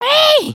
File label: wave, emotes